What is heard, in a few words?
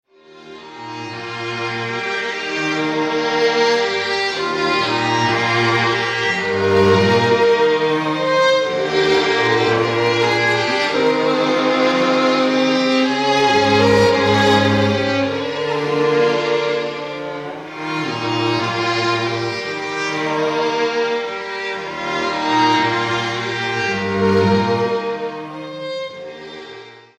strings